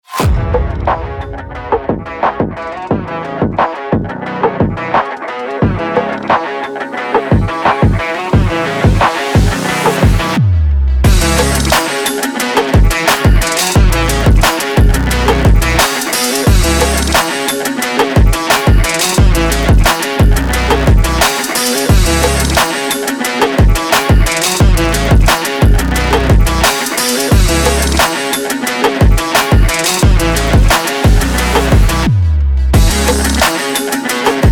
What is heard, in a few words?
stock music